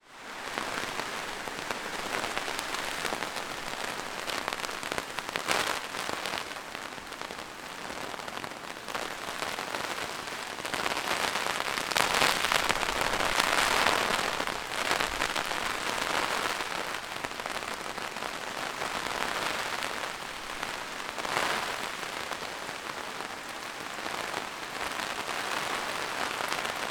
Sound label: rain blustery winds on umbrella; zapsplat